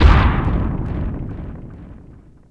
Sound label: Land; Bowser; New Super Mario Bros. Wii